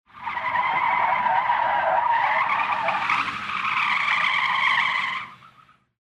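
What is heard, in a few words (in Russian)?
визг шин вариант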